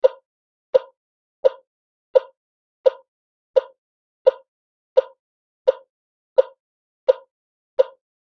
Heart Monitor; Infant / Fetal 2; EKG Heart Monitor; Regular Repeating Low Pitched Chirp, Close Perspective. Hospital.